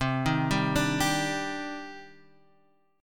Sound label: Eb Major 7th C; Ebmaj7/C chord; JGuitar